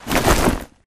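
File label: grunt movement foley loud long; Titanfall 2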